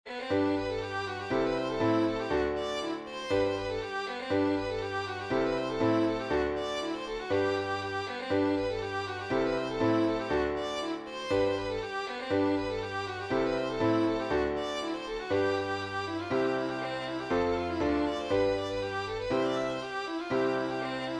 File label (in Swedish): schottis